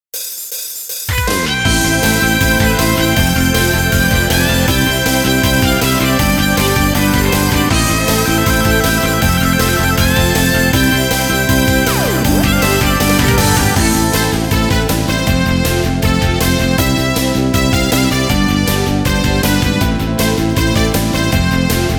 Success Camp BGM

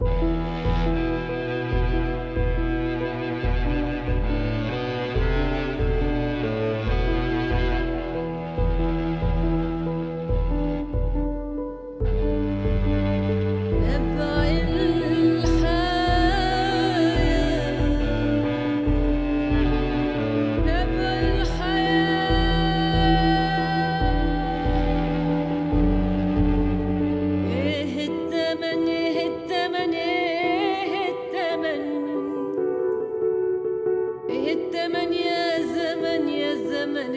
Action 140bpm Low; project zomboid

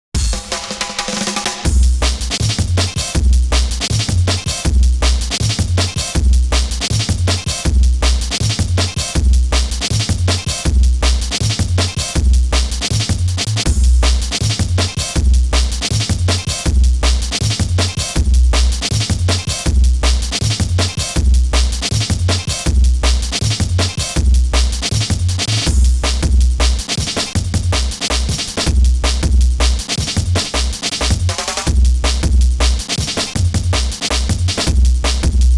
half pipe (Course BGM, half pipe); 1080 Snowboarding

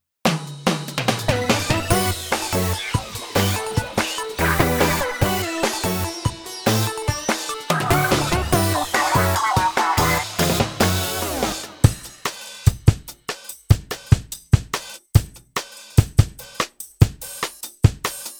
Defeat; Splatfest, Phase 2

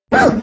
Bomb Collision; Angry Birds Space

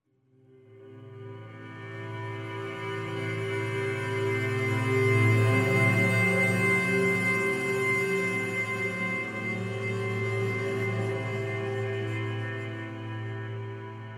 Exploration Outlands Solo string

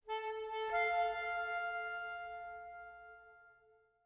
Shake Stinger; Scrap

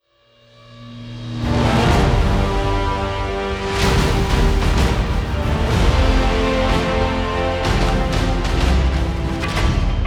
Various Jingles; metal gear solid v original soundtrack

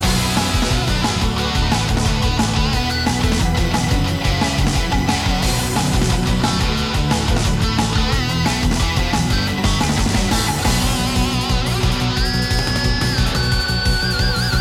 bgm battle; Sengoku Otome Legend Battle; PS Vita

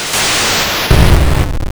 Recycle; Missile Command

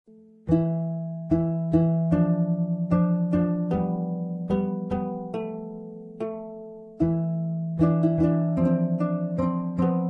Calm; the sorceress

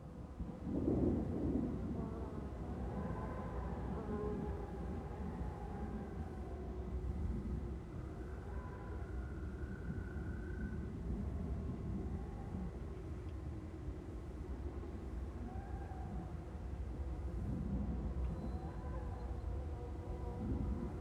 Wind Plus Loop; skykeepers; ps4 windows xbox one gamerip 2017

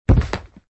step

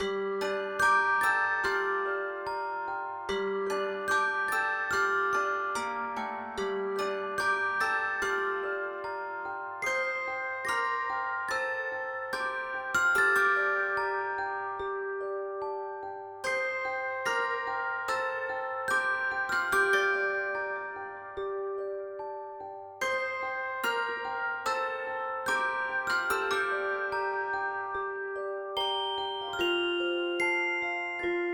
Music Box

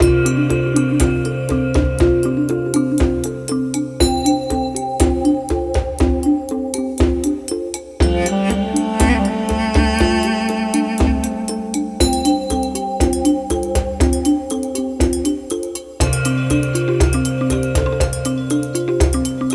120 bpm; legrand legacy tale of the fatebounds; Dorei

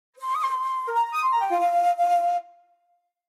Dialogue Box (Shakuhachi); B Path of the Teal Lotus

